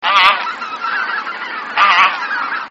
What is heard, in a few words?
hisikui